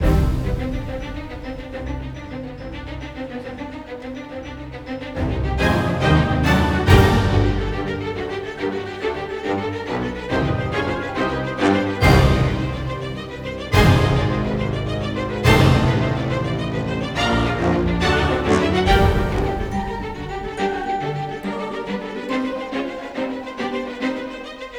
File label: Free Spin Music; netent